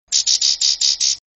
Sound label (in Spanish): pedorreta; campero